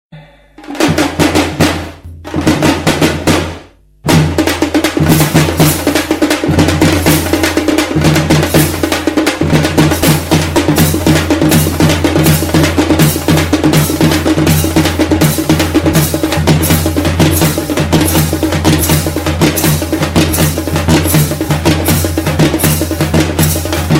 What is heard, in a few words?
New Drums